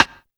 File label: STICK; Drums In Your FACE!